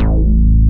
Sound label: acid bass; basses; The Sample Bank